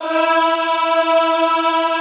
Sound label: EHH E3, Classical Choir; Best Service